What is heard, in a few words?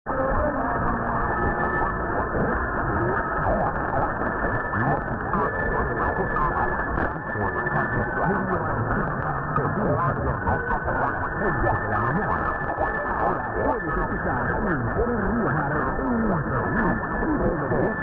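Colombia, radio; HJAC Emisora Riomar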